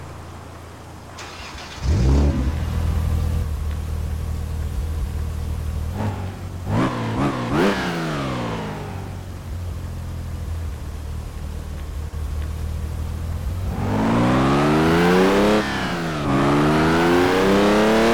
bmw m3 e30; ll-edition

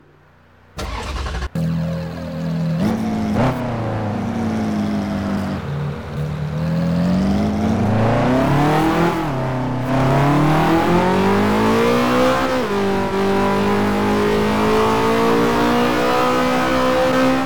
ferrari 458 speciale; 2014

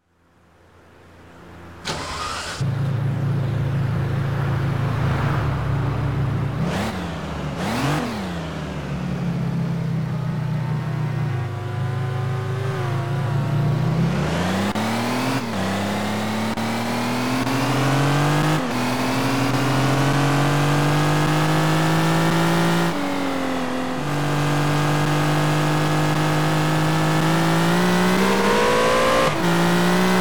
ferrari 575m; tdu

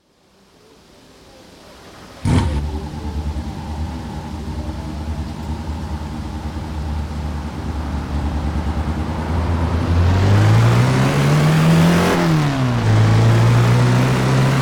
porsche 918 spyder

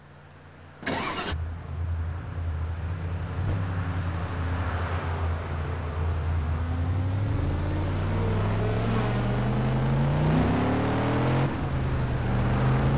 mercedes-benz g 350 bluetec w463

